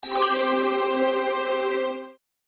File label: Electro Alert